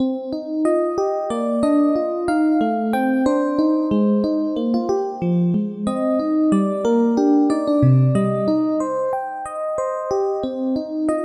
Piano Theme; Sounds of Neo-SF Read Only Memories

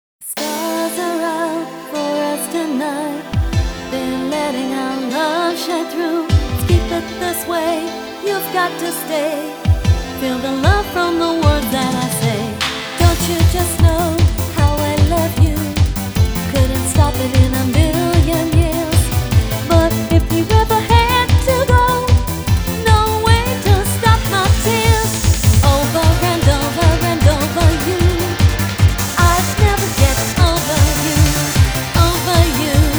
HYPER EUROBEAT; beatmania IIDX; ⅡDX style

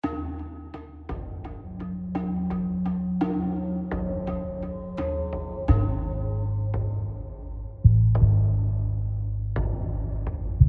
Tension; AB Tension 5 High Block 5

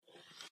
Slide; friday the 13th killer puzzle

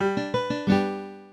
Boot Sound; LeapPad Learning System

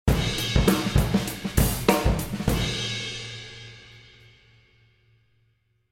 Dial Drum Performance; Scarlet Hood and the Wicked Wood

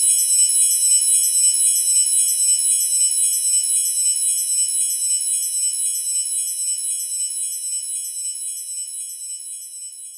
Windchimes

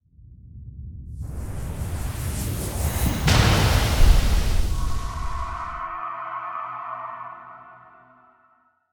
cinematic dreamer mask break on seal; Hollow Knight

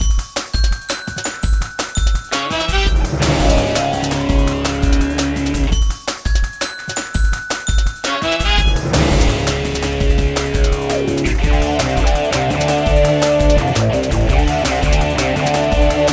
Music; cartoon network punch time explosion xl 2011 gamerip

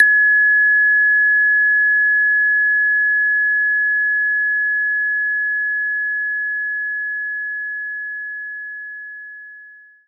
Kalimba C5; knights try

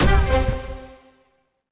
Jingle, Invasion Unlocked; rayman legends the complete soundtrack gamerip 2013

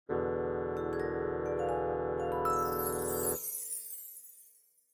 Times up; Pictoparty; Wii U

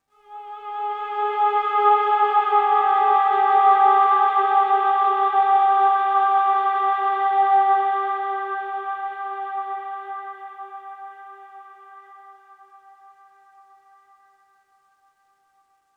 Oneshot Synth; zombie army 4 dead war; ps4 stadia switch windows xbox one

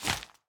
flap

